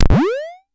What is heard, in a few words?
Pollard Syndrum